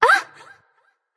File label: surprised light; smite; voicepack; hel